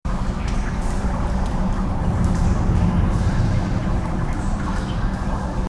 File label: dungeon water